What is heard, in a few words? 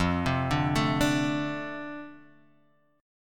F 6th F, chord; F6 Chord {1 3 0 2 3 X} arpeggiated